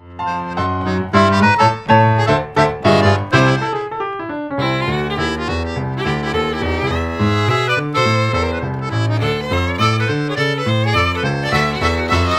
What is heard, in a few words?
ringin' bells rag